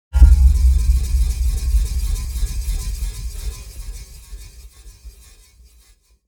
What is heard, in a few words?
Rusty table fan spinning; appliances